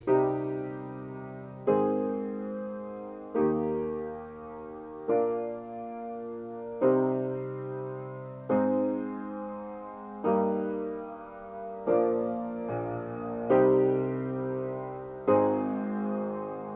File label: Static